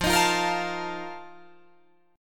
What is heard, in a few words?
F 11th F, down, Guitar Drop D